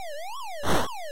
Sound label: Warp Tunnel